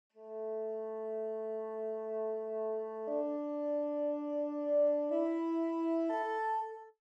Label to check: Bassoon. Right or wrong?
Right